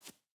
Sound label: footstep hide concrete jump start; Rust